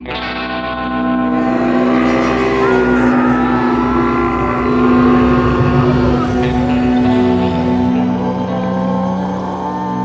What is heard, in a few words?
Splash